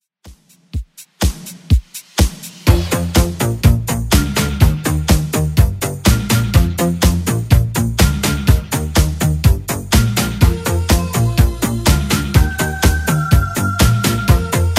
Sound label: 80s Redrum 124bpm